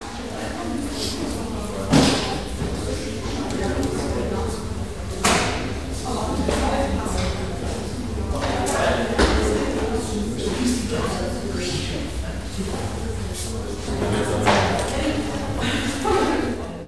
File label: school students; best service Blue Box; crowds interior